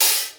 old school soft sounding open high hat sample e key